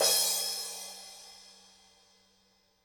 CRSH, CRASHES

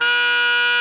SOP GR B 3, Saxophones; Greytsounds; Philip Wolfe David Crozier's Saxophones

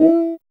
cuica open; ETH. PERC; Drums In Your FACE!

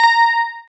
GUI, E-Guitar

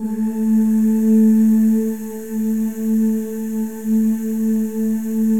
M+F OO A3; Spectrasonics; Symphony of Voices; M+F OOS

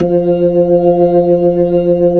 B-3, Jazz

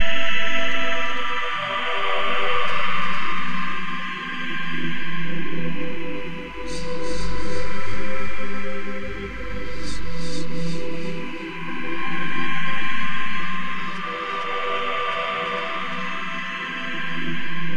WHSPR CHOIR

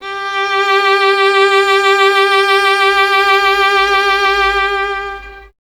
violin, Solo Instruments; Miroslav Vitous; Solo Instruments VOL-2; Solo lnst. II

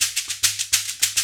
SHAKIN, ETHNIC PERC; Roland LA

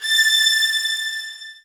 A, soft violins; Q Up Arts; Denny Jaeger Master Violin Library

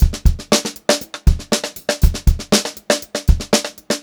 119 groovy beat 1, funk groove drumloops; USB Soundscan vol.38; AKAI

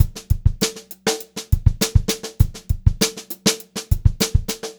VAR, Funk-Groove Drumloops

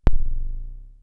DF-500 Misc, Drumfire

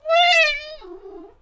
Kitten, cartoon Foley Fx; musicradar; cartoon caper

